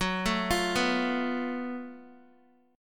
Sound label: Gb Minor Major 7th Double Flat 5th, Guitar, chord; GbmM7bb5 arpeggiated; x,9,9,10,11,x